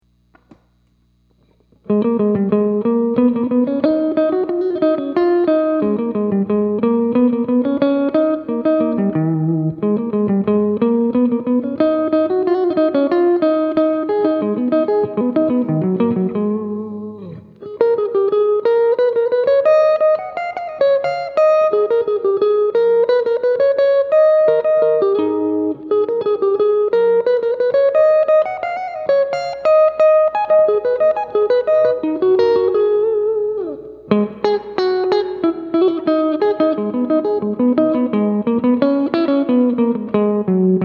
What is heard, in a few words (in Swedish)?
polska fran medelpad